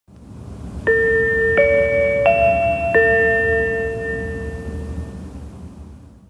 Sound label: chime